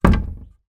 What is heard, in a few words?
foley wood plank lean up against wall rattle; zapsplat